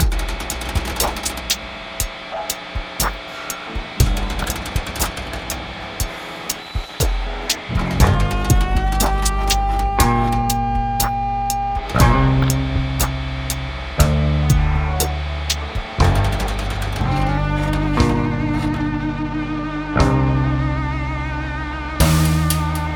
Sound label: noise; weeklybeats 2024